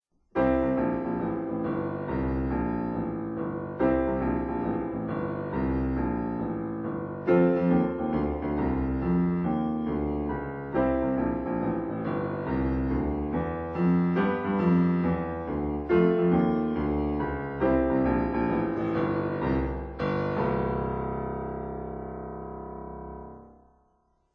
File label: Cool Walkin Bass